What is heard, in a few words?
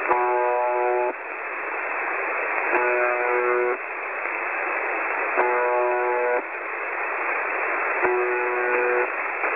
websdr recording 4625.0kHz